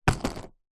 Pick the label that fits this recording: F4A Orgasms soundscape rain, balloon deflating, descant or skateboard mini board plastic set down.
skateboard mini board plastic set down